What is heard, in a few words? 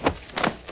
land; 5element